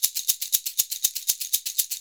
SHK, Shaker; Univers Sons; Basicussions

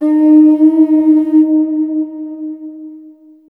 AF HRN D#4, AMB HRNFX

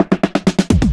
Fills 128BPM, Clubsounds

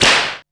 FX SHOT; Back In Time Records - Big Bang

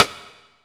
Rim

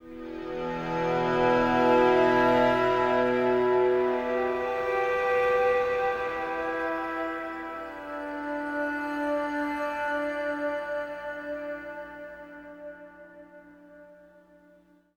Acoustic Drone; Sonic Foundry (Sony Creative Software) - Friendly Fire; DroneLoop

